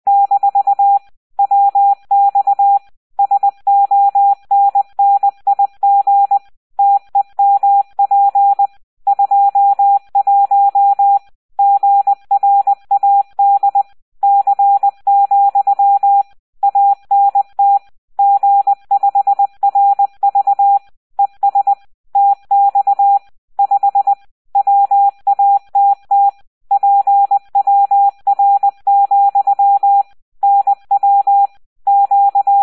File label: cw